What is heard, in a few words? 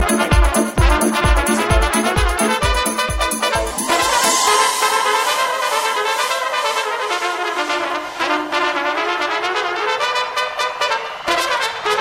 Techno Popfolk